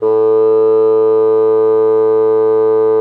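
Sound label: bassoon mezzo A2; Xsample VOL-6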